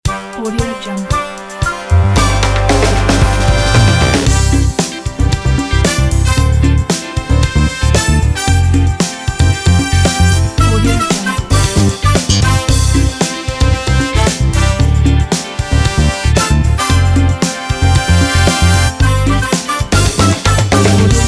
show opener

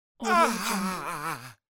male yell

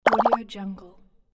bubble pops

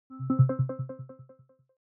ui button echo resonance arpeggio

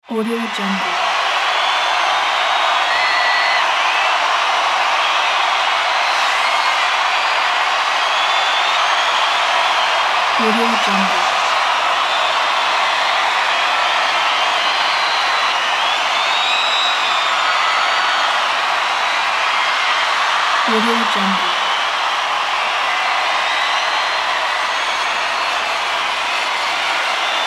concert stadium fans cheers applause

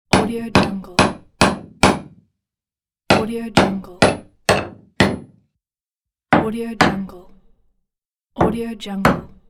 hammer a nail

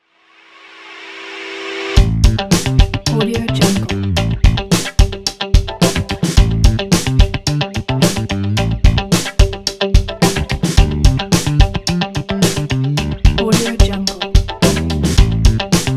funky blues

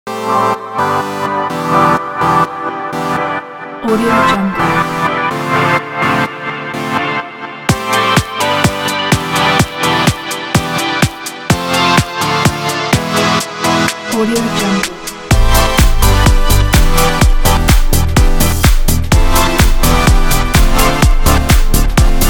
fashion beat pack

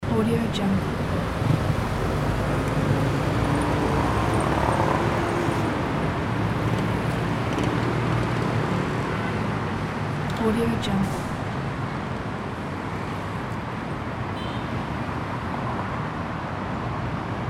city traffic asia

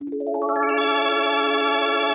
organ arpeggio; blast drive 30; prosoundfx

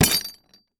table impact; prosoundfx

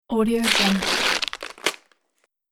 ripping paper bag

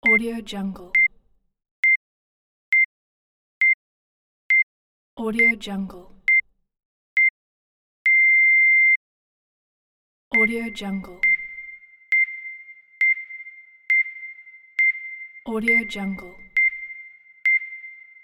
countdown beep tone

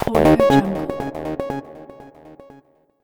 corporate logo

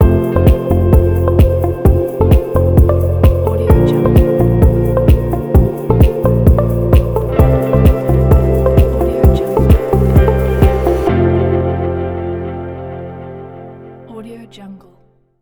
corporate ambient elegant logo sting; trendaudio

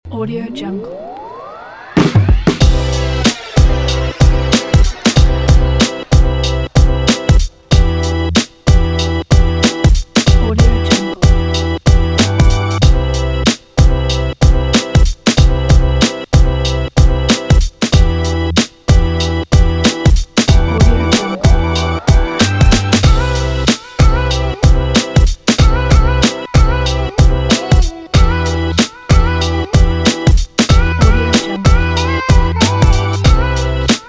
hip hop motivation energy

